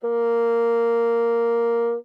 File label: Bassoon ff Bb3